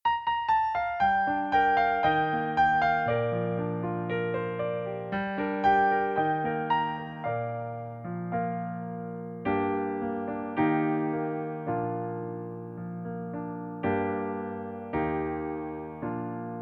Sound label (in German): Pianoplayback Bb-Dur